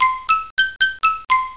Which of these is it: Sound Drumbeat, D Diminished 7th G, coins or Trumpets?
coins